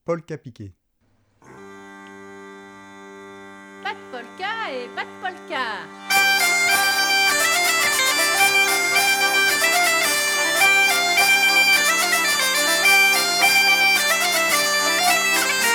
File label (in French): Polka piquée Pa Piqué Tpo normal Inst